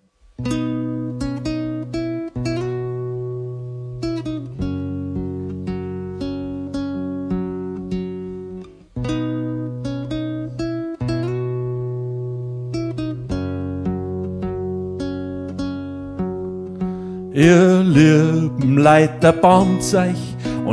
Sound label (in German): Trommel; Gotzinger